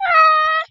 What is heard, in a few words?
cat; NewGamePhysics; GravityChooser